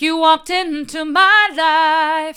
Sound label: hard, vocal